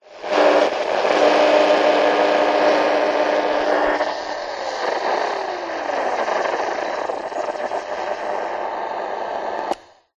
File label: radio static buzz hum